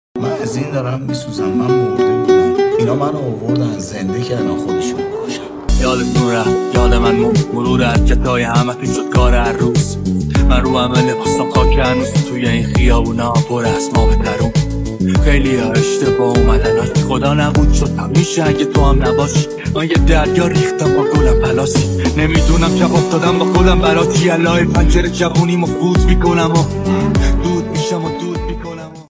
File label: Remix